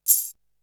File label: Tambourine; ZERO G - Datafile DISC 3